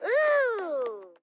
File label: "uhhh"; Bunnicula - The Cursed Diamond